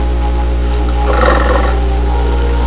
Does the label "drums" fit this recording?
No